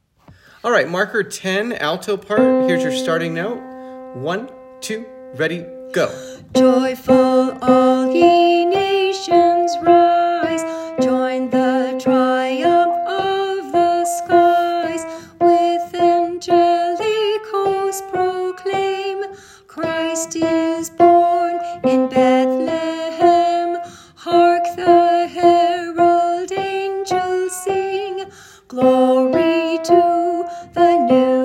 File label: Alto, choir